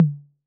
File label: Tom Mid C 808